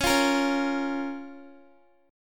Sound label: C sharp 6th C sharp down Guitar; C#6; strummed; tuning=4E,4A,5D,5G,5C,6F